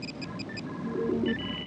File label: viewscreen on